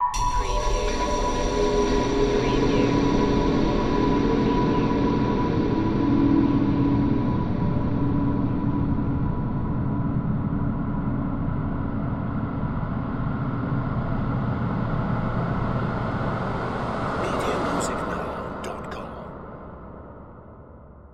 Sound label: Hit Burst